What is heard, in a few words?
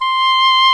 SOLO WINDS